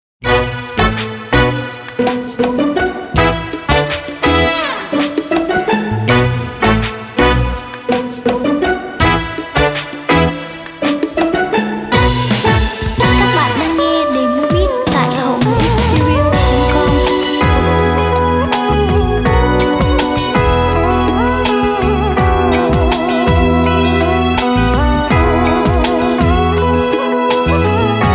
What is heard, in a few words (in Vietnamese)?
beat